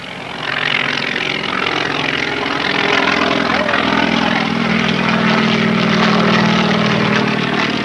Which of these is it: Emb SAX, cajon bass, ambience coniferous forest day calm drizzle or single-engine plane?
single-engine plane